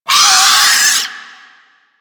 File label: Arm Vocal Wind Up; Warframe; Man In The Wall